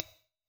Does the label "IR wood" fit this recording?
Yes